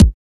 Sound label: 2000s clicky bass drum g sharp key, kick drum sound tuned to the G# note